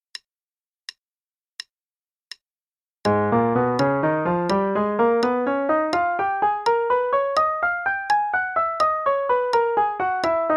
gsharp mel minor, 3 octaves (qn=84); CM Level 6; g# melodic minor

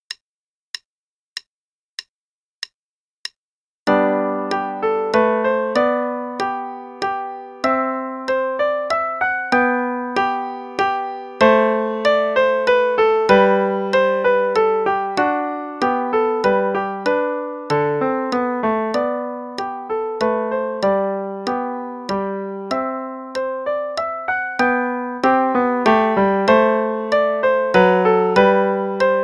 Minuet in G Major, no repeat, qn=96; Bach; Bach Notebook

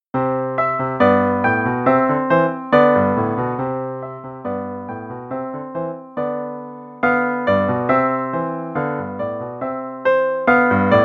no click, both parts, qn=140; Level 1 Performance - page 12; A Merry March

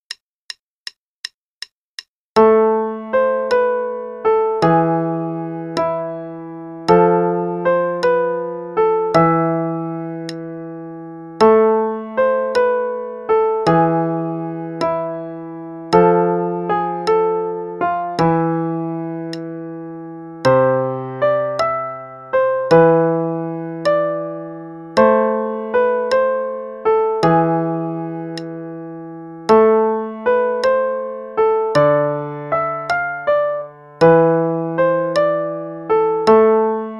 Play-along, dq click, en=160

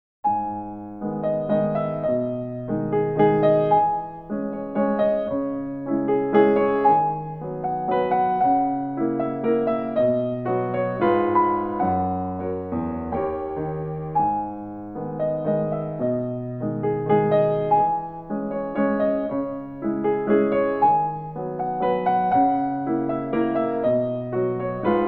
Waltz In G Sharp Minor; Marlais Book 7

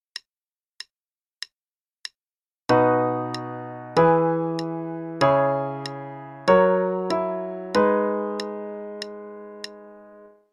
Chord Progression - B Major (qn=96); CM Level 6

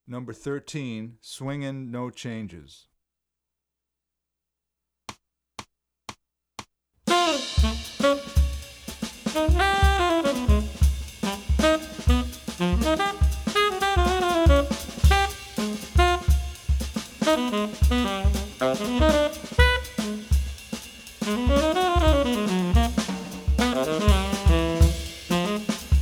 Swingin No Changes, Trumpet, jazz; 14 Jazz and Funk Etudes; CM Level 6 Solos (jazz)

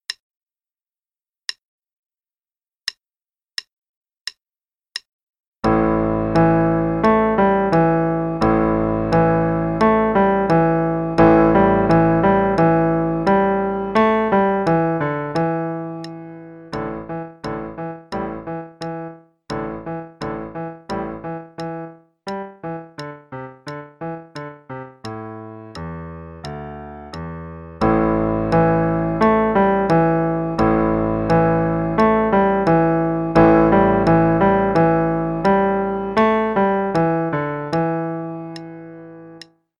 Improv (qn=88); Level 1; Young Hunter Improv; Faber Improv

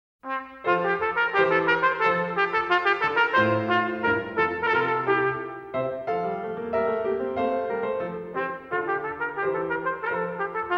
Trumpet Air; Canadian Brass Intermediate Solos

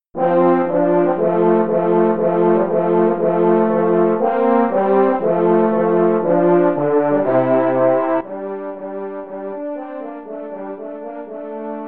Easy Classic Duets, French Horn; Toreador's Song

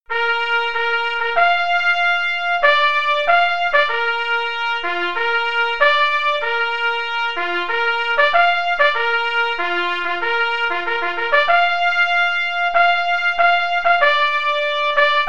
Bugle Call Retreat (qn=96)

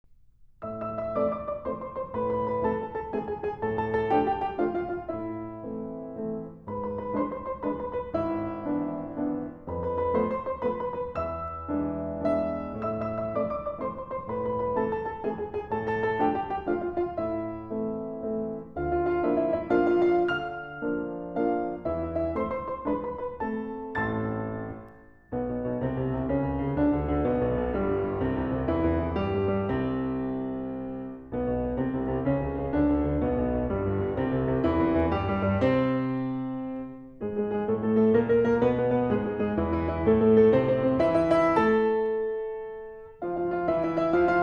Russian Etude; Keith Snell Level 08 Etudes

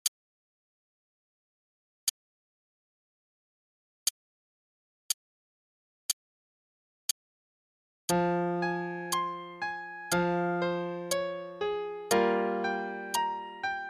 Play-along Sonata K466, qn=60; Scarlatti

